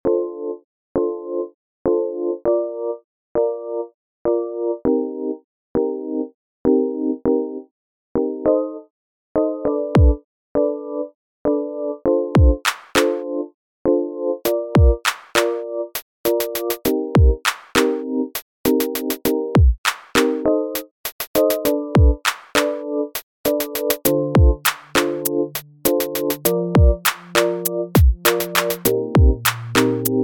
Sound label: only chill